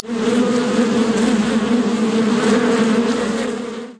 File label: Night Wasp